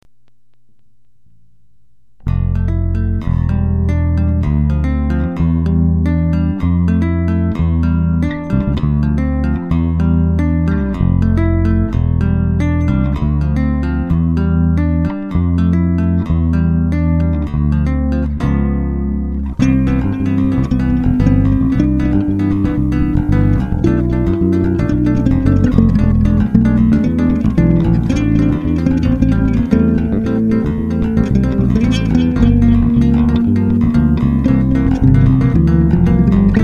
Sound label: two five